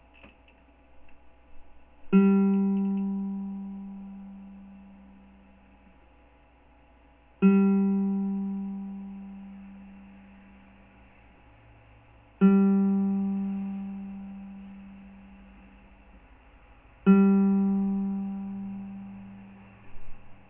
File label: ukulele SOL